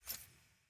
emotes pickup; emote panel

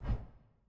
ui fanfair hover

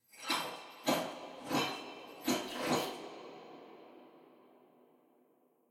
sfx br anim walk rl windowlook in

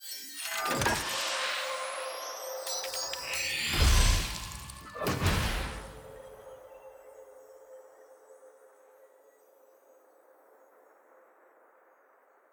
capsule tier 1 ante; clash

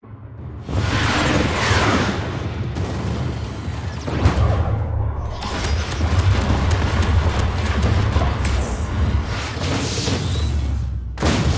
intro sound splash; gun goddess miss fortune

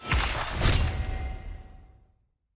sfx mastery; eternals eog mastery 4

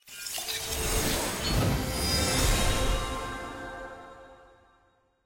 celeb large; tft

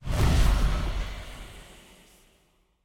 action mist teleport

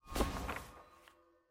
record finish click; jinx

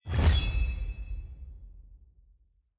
lobby notif captain granted; parties

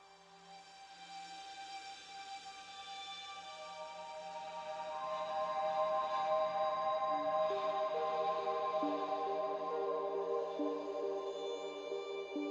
theme; sn2023music

